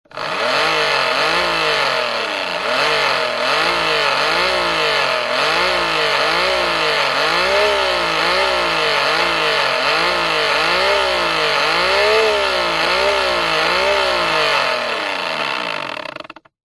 industrial pump electric handheld revs close to motor powerful